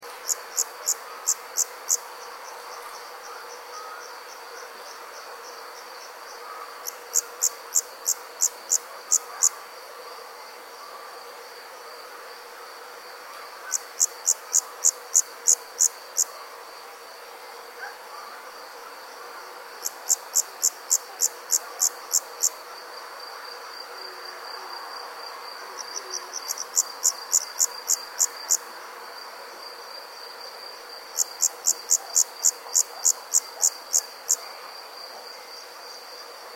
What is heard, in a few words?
insect sounds at night